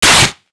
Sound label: sg552 claw, weapons; buff sg552ex